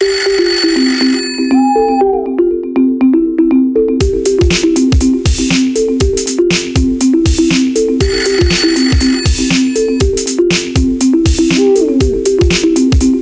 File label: Jungle Marimba Ringtone